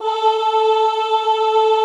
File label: OHH A3, Classical Choir; Best Service; Extended Classical Choir